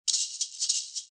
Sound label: maracas